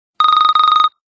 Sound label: Sms Buzzer